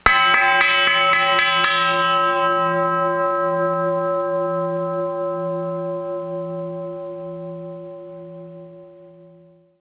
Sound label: lg gong